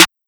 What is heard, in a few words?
Snare; FL Studio; young kico drumkit